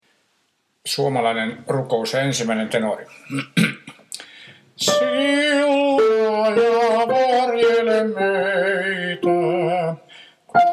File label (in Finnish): tenori; 1. tenori; Vetelin poikakuoro